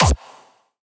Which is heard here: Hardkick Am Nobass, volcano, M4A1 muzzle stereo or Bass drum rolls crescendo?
Hardkick Am Nobass